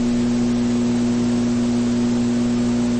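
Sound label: humming at production in a workshop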